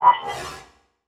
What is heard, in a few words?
Transition Metallic Hit To Whoosh; Production Element; Title Transition; AdobeCC